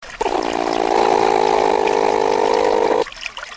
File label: platypus